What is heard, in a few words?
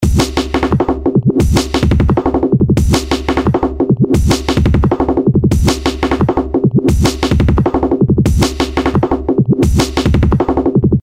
175bpm